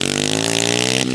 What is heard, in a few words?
engine; MX Unleashed; XBOX